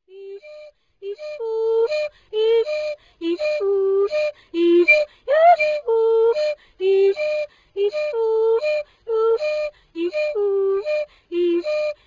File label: Babenzele Pygmy voice w Hindewhu